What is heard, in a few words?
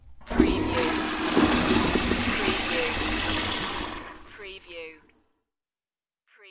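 Toilet Flush; Household Sounds / Bathroom Sounds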